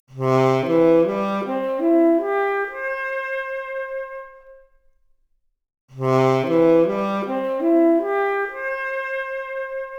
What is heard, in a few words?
Saxophone arp, mp Stereo output noised, 4bit